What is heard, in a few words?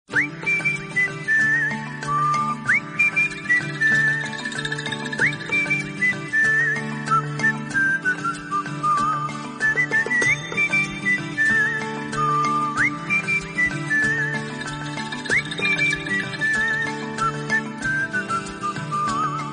whistle ringtone